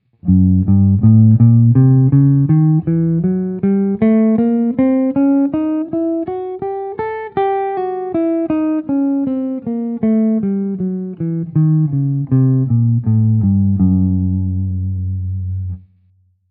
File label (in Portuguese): scale gb, escala diminuta dominante semitom tom